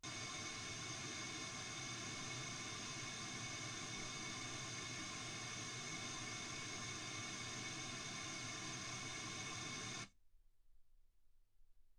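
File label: white gaussian noise 48kHz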